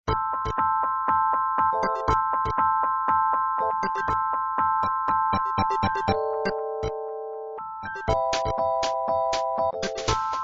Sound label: ring